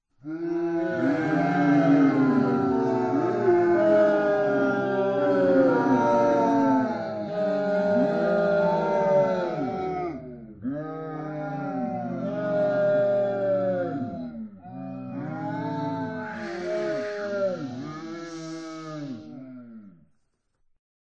boo

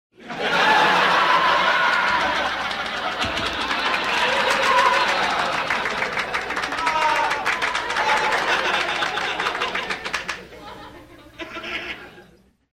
laughtrack; cave

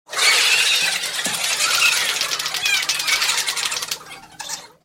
laughtrack

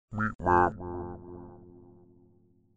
meep mop

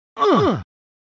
ungh; cave

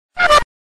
toot; reverse

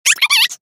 clock

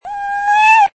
cat; reverse

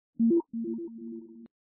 steam

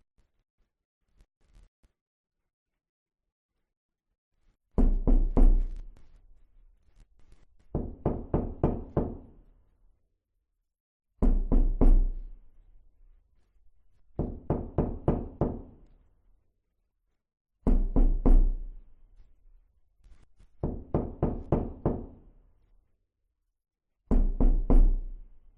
knock

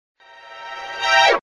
alert, reverse

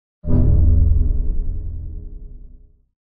error; vapesquared

